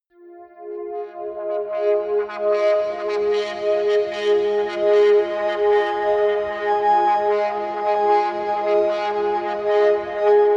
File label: atmospheric feedback pad mid, drones